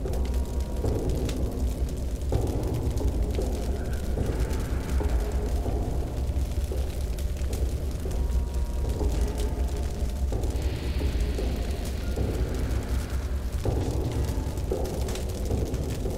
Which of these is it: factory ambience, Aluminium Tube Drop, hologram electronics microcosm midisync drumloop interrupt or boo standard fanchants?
factory ambience